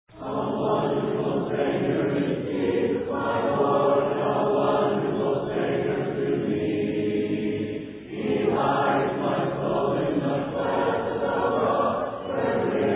a capella hymn; a wonderful savior

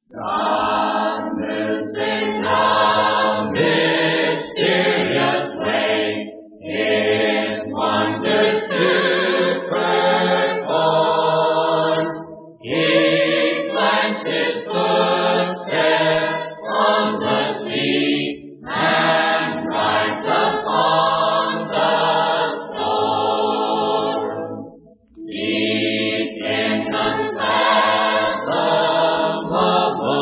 a capella hymn; god moves in a mysterious way

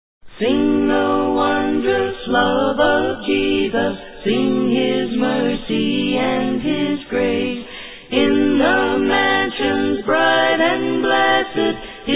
a capella hymn, when we all get to heaven

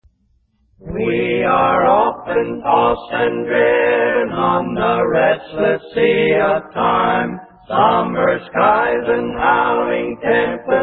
a capella hymn

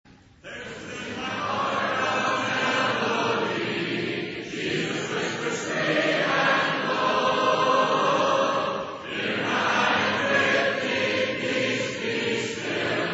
a capella hymn; he keeps me singing

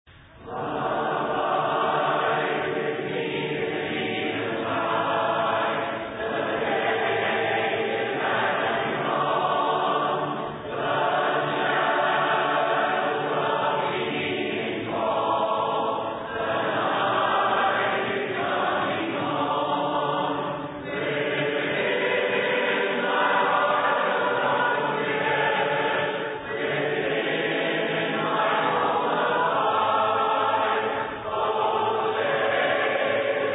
a capella hymn; abide with me

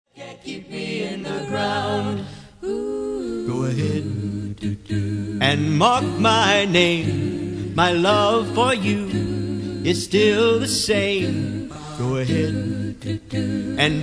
a capella hymn

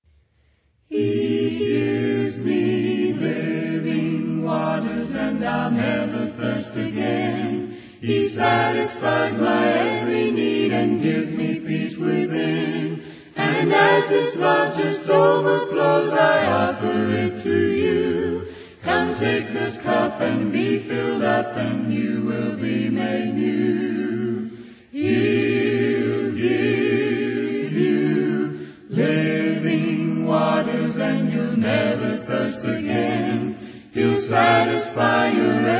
a capella hymn; living waters

